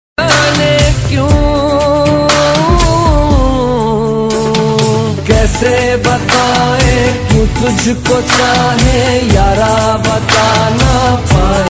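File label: trending reels song love background music ringtone; trending instagram viral reels song love bgm ringtone instagram reels ringtone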